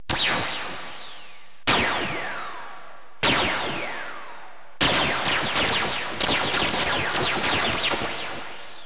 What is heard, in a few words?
scifi